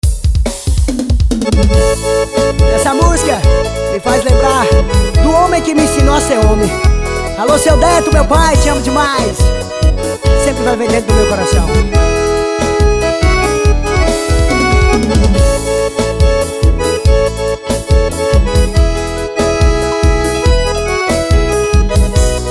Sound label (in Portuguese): arrocha; arrocha universsitario